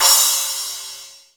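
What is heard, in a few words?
STANDARD CRASH, MPC-60 DRUMS; Greytsounds VOL-1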